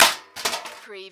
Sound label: CARTOON DAMP CRASH